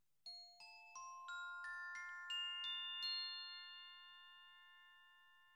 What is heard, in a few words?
Glock